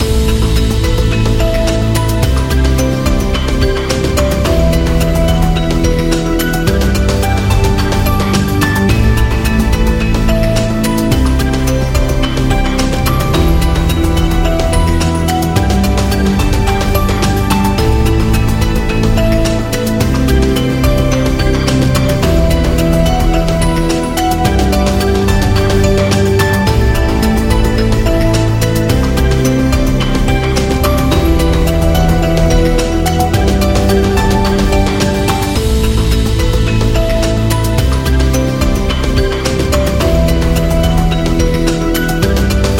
Technology Loop; service business

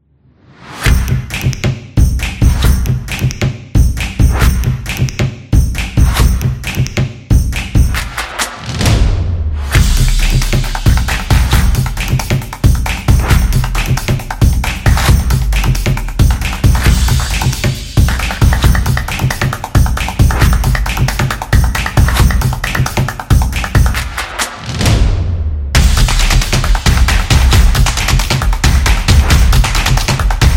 In Drumming; sports beat

